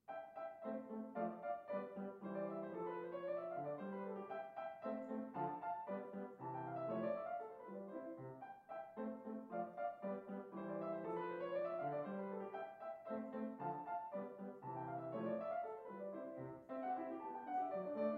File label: Sonata K545 Rondo, Piano; Mozart Sonata 16 K545